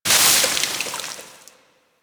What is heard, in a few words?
Ocean Door Open Drips; Ambience; Warframe; Grineer Ocean